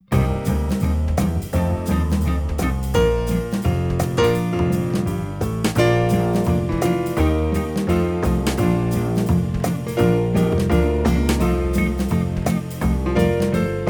Clarinet to play along; Down by the riverside

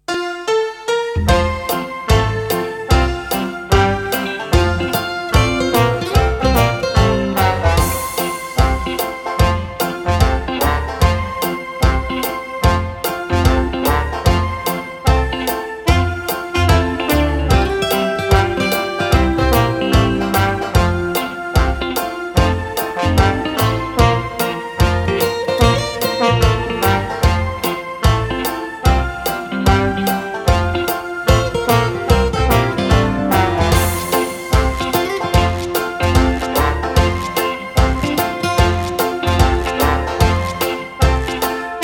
Oh when the saints, recorder

